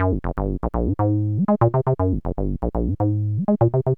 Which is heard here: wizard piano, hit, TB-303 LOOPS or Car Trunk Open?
TB-303 LOOPS